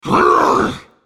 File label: spell cast effort; Renekton